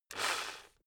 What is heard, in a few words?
rock drill rev low short; maschinen; bohrer